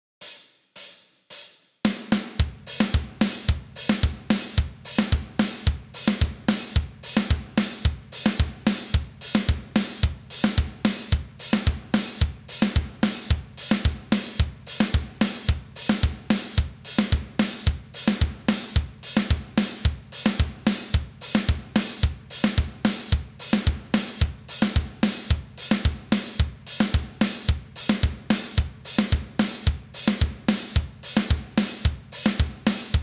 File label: Groove